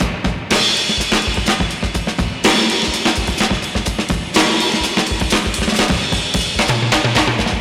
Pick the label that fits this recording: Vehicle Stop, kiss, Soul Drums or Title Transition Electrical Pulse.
Soul Drums